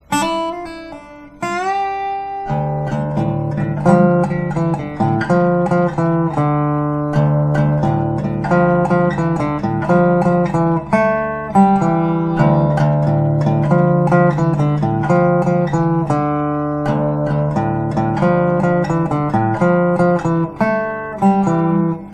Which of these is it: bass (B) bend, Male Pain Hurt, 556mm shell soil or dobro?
dobro